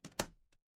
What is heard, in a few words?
door close